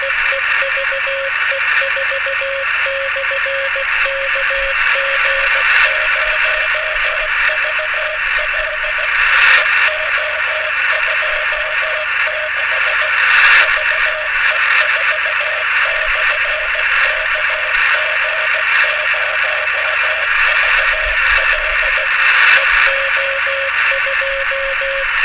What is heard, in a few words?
auroral waves; VHF Radio Aurora Audio